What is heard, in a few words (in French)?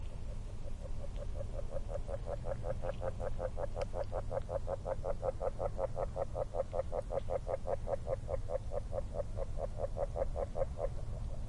grenouille agile